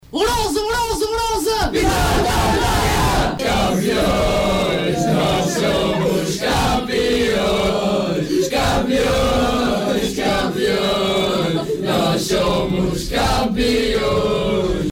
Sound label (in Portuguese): grito grito